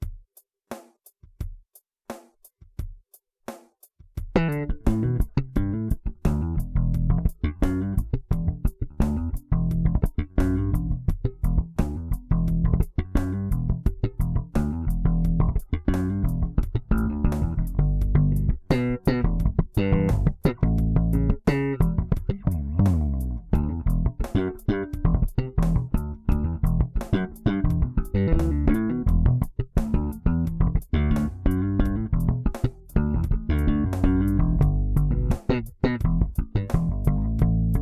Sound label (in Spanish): Slap; Bass Series BT-450; Tomado con micrófonos de caja 1X15; Eminence Legend CB158; caja 4X10